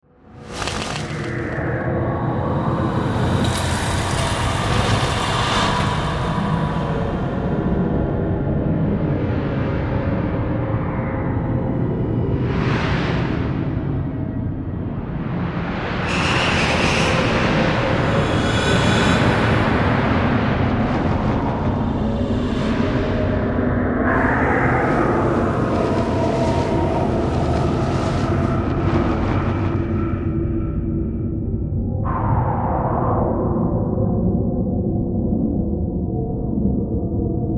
Ghostly Dimension, Horror, Ghostly, Dimension, Demonic; Ghosts; Blastwave